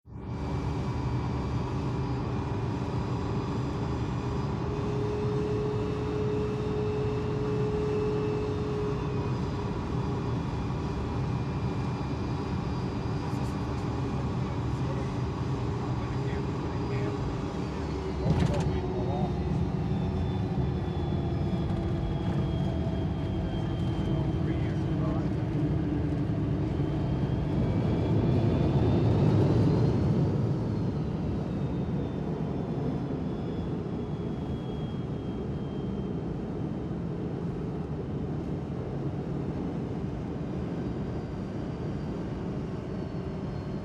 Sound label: Boeing 727, interior, land & taxi with announcements